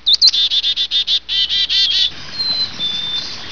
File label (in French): mesange tete noire